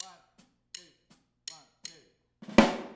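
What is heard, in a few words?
Intro Overhead stereo, drums, 162 BPM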